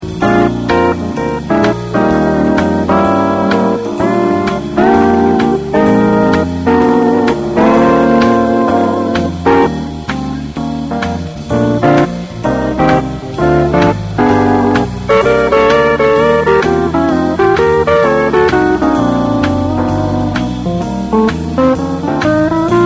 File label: Blues To Use Solo